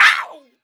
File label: ow